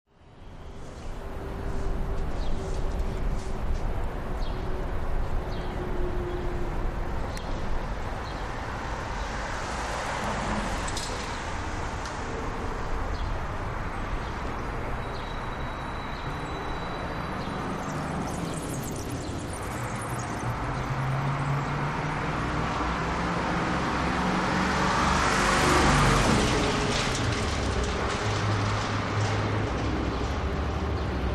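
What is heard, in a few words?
New York City Ambience, big city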